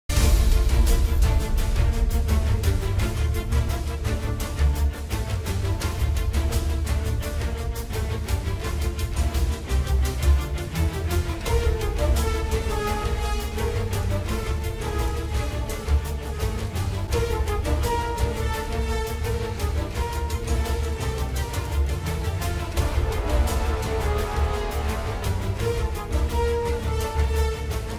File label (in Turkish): Enstrümantal fon müzik; Mehmed Bir Cihan Fatihi